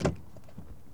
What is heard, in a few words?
Truck Expedition Door Open; Trucks